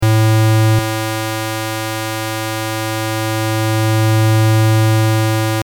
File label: lfo hp; nyquist